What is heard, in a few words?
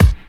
hip hop kick drum single hit c key